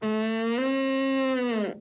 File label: moose death; mob